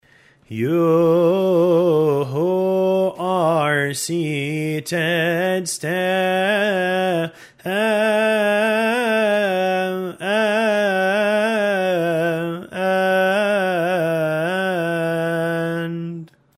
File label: you who are seated stand gregorian